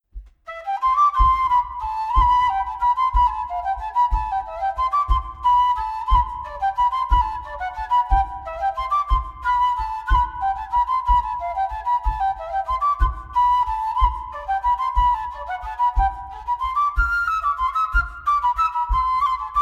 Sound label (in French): Montagnarde; Lefebure Wely